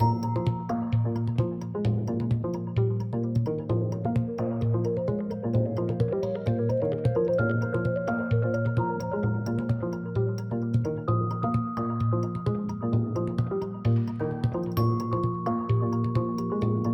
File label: rhythm loop; soundtracks; vikings